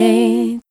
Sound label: Vocal, One-Shots; Foundry (Sony Creative Software); Rondo Brothers Trip-Hop Loops & Samples; Hard Rocks The Roof